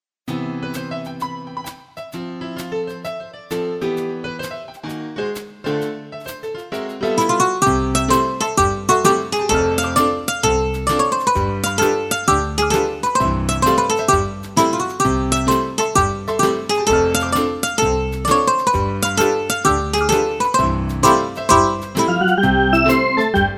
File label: Just A Piano Ditty